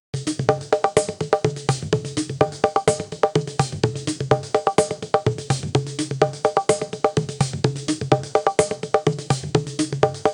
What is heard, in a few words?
tabla with drum beats; instrumental